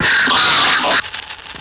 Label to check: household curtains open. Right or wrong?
Wrong